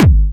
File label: Medium, kicks